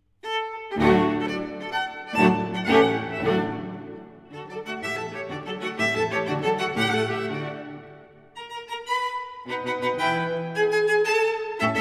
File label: String Quartet Trio for Violin Viola and Cello